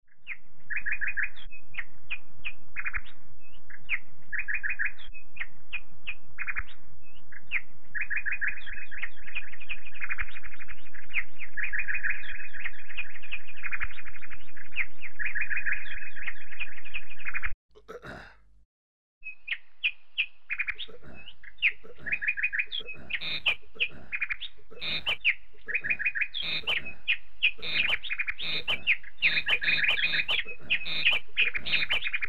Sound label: nightingale; BirdMan